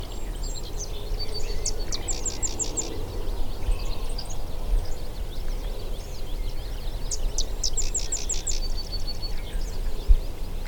Cistothorus platensis; Sedge Wren; MI; Michigan

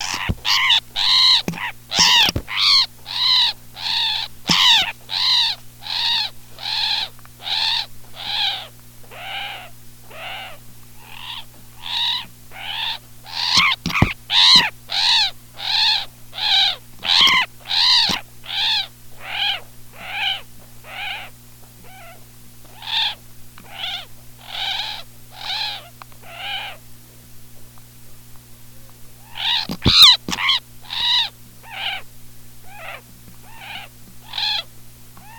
Coccyzus erythrophthalmus; MI; Michigan

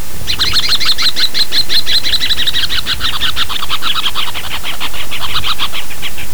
Lesser Rail; Birds

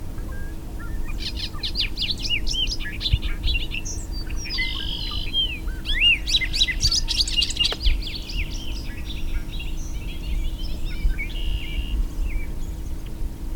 Dolichonyx oryzivorus; Bobolink; MI; Michigan Bird Songs

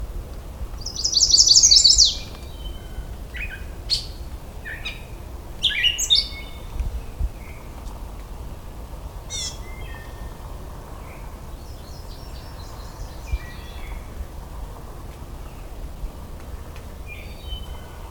Dendroica pensylvanica; Chestnut-sided Warbler; MI; Michigan Bird Songs

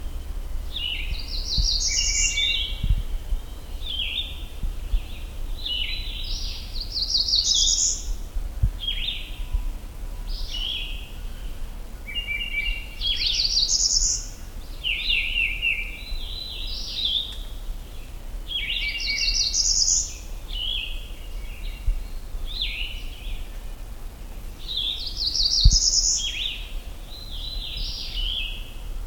Dendroica cerulea; Cerulean Warbler; MI; Michigan

